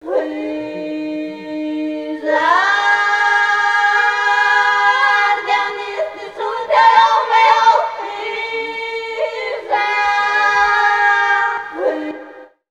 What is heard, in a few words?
CHOIRS; Best Service; Ethnic Journey Sound Cube